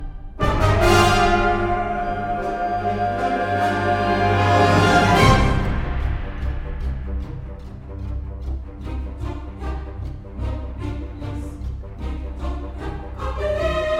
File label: Battle All Layer; The Dwarves 2016 ps4 gamerip